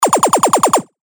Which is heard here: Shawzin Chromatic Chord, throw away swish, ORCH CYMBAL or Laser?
Laser